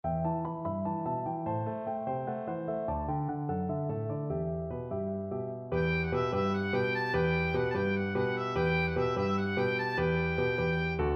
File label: ajde jano, oboe